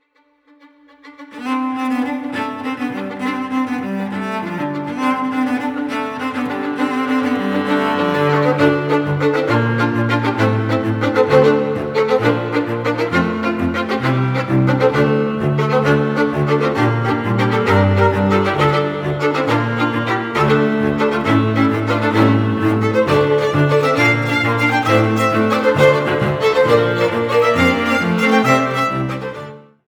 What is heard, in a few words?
strings